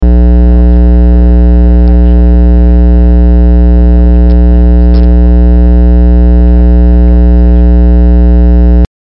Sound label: Harmonic Hum before